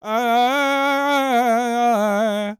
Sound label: World Voices, CHOCTAW